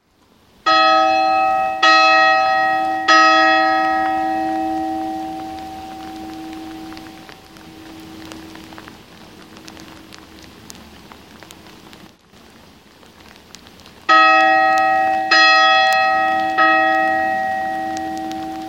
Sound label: Angelus bell in rain